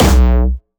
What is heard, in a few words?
B1, Jumpstyle Kick; Bassdrums; Jumpstyle Multis; vengeance essential club sounds-3; VEC3 Bassdrums